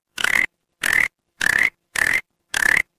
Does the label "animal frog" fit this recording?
Yes